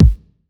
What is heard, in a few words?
Kick, Trance